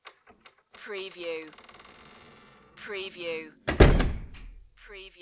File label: Heavy Door Creak and Shut - without reverb